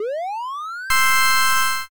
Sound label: rare